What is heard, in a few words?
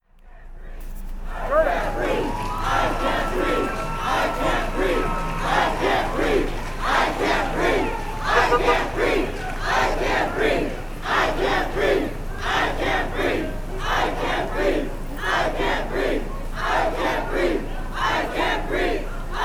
Protest Chants; Oxnard; I Can t Breathe